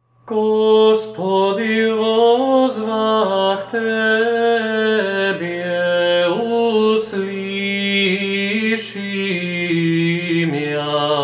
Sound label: Gospodi Vozvah treci glas, Serbian chant